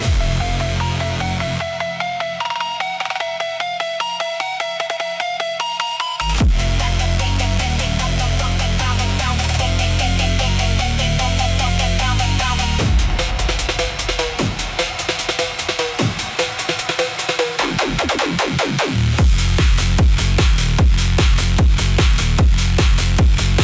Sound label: 150BPM